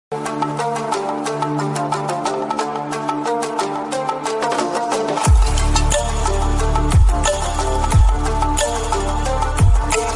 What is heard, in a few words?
awesome instrumental music tone